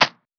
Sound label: Percussion